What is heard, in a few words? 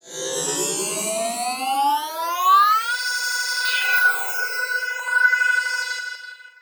Atmos sweep